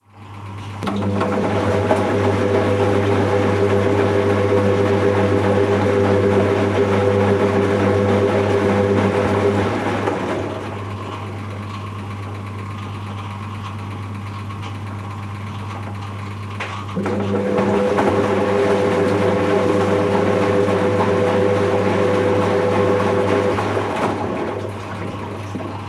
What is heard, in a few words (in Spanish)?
lavadora; ruidos